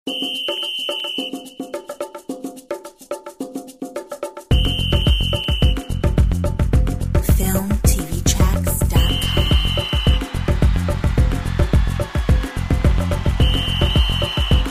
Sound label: Samba cup